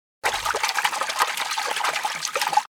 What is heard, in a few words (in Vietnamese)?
tieng ca vung vay trong chau nuoc